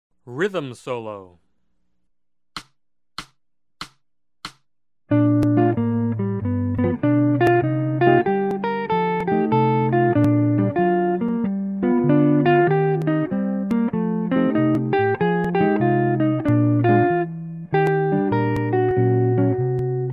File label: Rhythm solo, guitarra; jazz